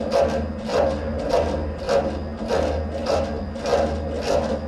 fan

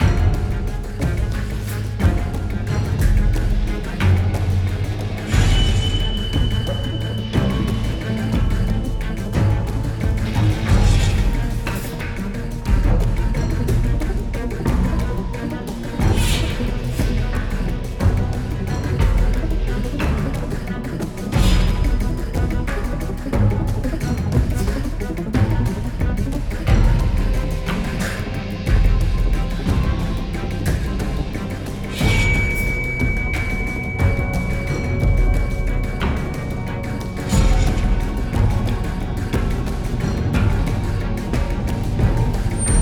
loop Action; the walking dead michonne 1 in too deep

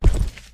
SFX Footstep; this is the zodiac speaking 2020 gamerip switch ps4 xbox one pc macos

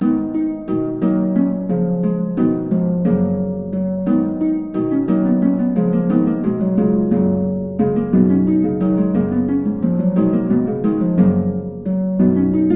Harp; The Bard's Tale Remastered Trilogy

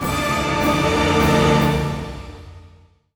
Music stings Trans up; the raft

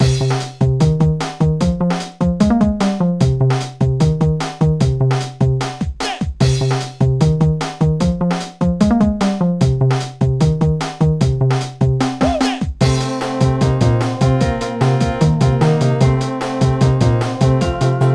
Hip-Hop Stage Karaoke; pinky street kira kira music night pop town gamerip

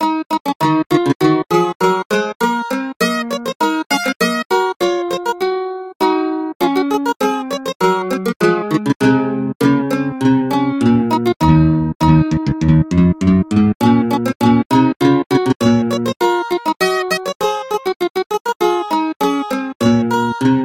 Mandolin; Zanduvar Carack; The Bard's Tale Remastered Trilogy

